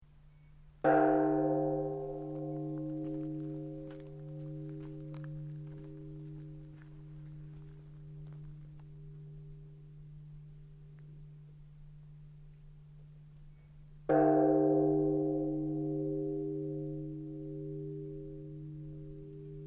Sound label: Ambience Sannohe Temple Bell, Temple bell rung nightly at 8pm; Church; Churches